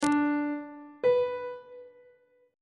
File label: relative minor of D major is B on treble clef